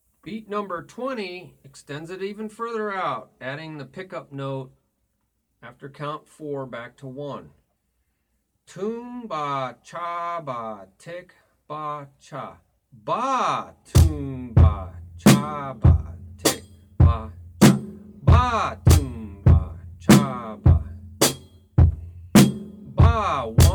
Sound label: Beat Toom Ba Cha Ba Ti Ba Cha Ba